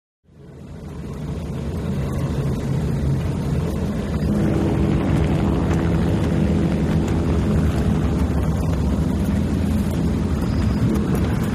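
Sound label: Power Boat Race Ambience, off shore power boat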